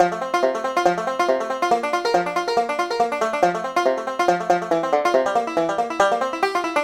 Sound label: banjo loop